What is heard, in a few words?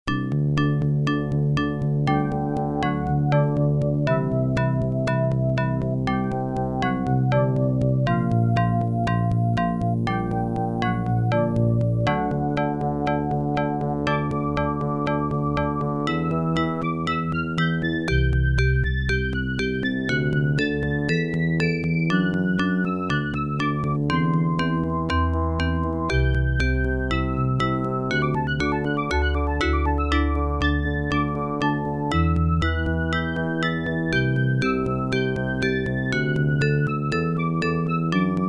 music; Jill of the Jungle